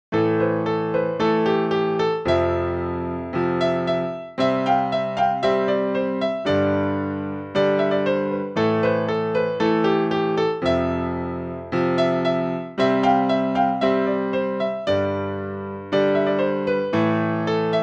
bgm tamil keyboard cover song

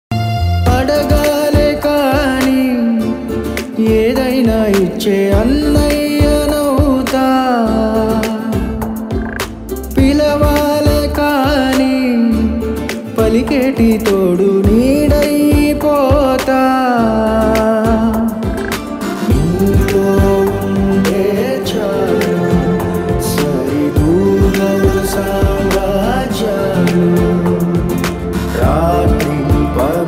telugu ringtone bgm; bimbisara

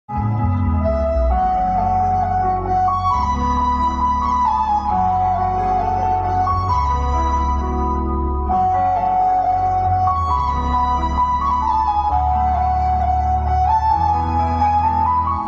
breakup emotional bgm ringtone telugu ringtone; pagal; vishwak sen

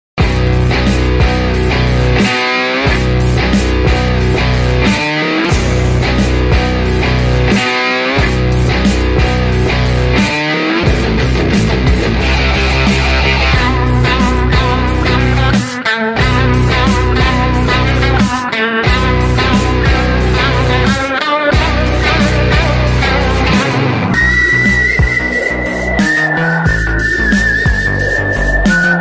kabali bgm ringtone rajinikanth mass bgm

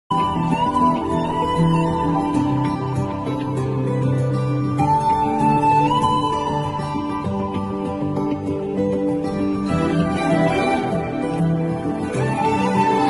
love bgm telugu; rowdy boys

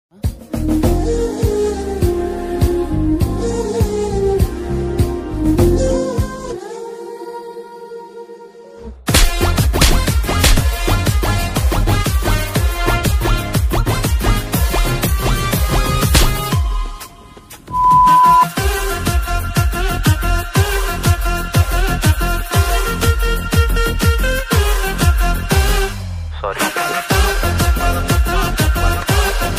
trailer bgm ringtone; sammathame movie

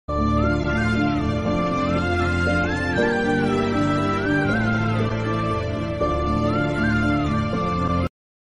love sad bgm sad bgm ringtone; thiru

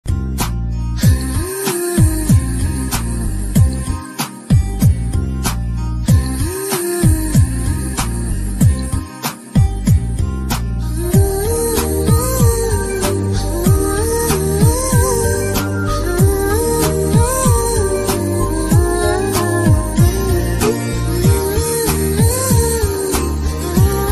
bgm love ringtone melody, telugu ringtone; bommarillu

